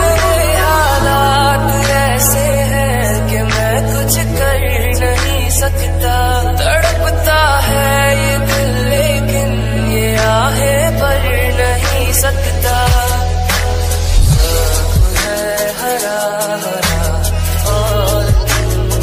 sad status ringtone, bollywood ringtones; whatsapp

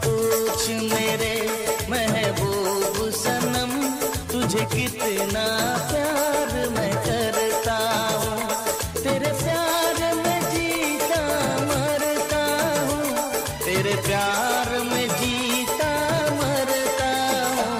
ringtone, bollywood ringtones

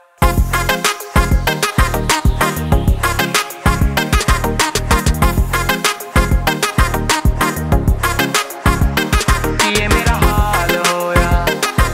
hindi music ringtone, bollywood

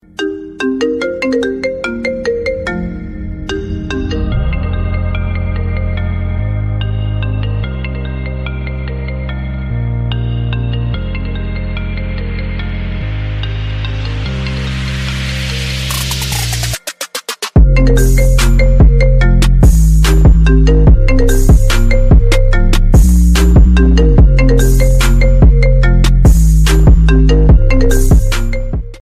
iphonex remix ringtone

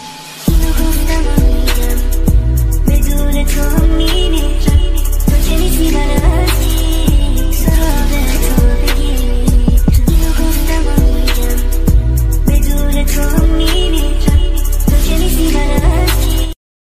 remix music ringtone, bollywood ringtones

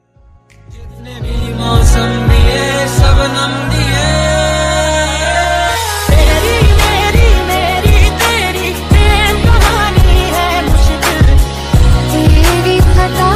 bollywood boy sad ringtone

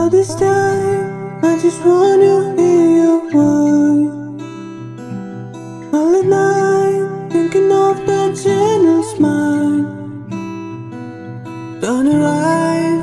english ringtone

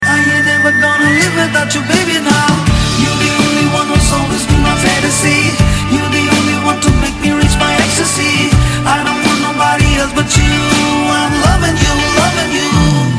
english song ringtone

